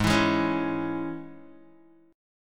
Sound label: Ab Suspended 4th Sharp 5th Guitar, chord; strummed; JGuitar